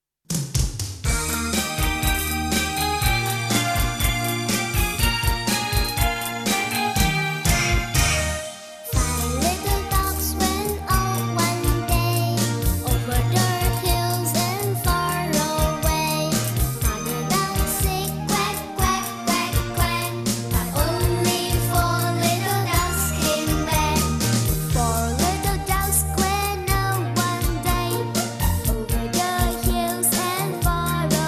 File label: five little ducks chant